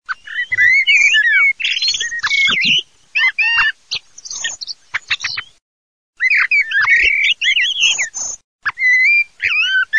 merlo chiocco striscio

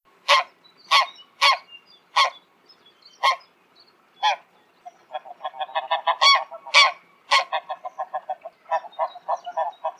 Oca selvatica

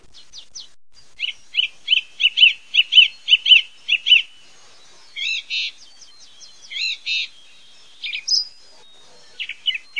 TORDO francese

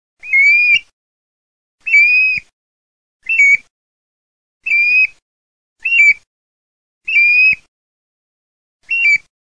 PIVIERE

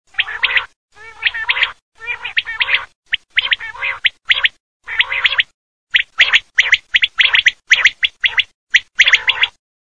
QUAGLIA

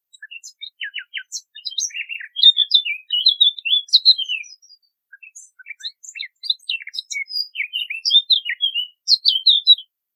CAPINERA

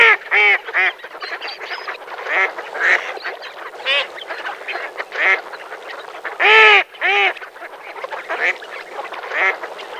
GERMANO

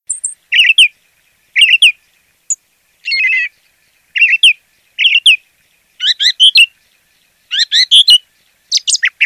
TORDO BT, birdsound

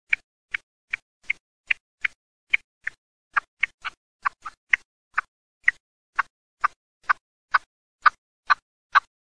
PERNICE, birdsound

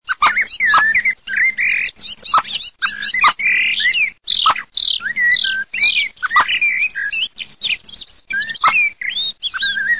MERLO CHIOCCO PIPPO, birdsound

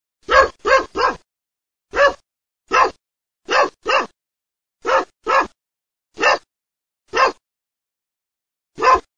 cane abbaio

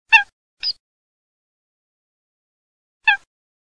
Folaga

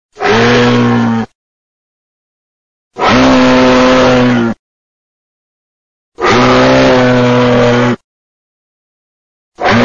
ALCE EUROPEO

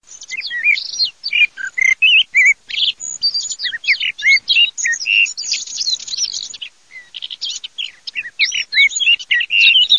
MERLO CAPINERA, birdsound

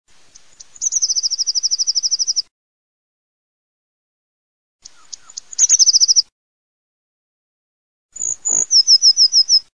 CINCIARELLA, birdsound

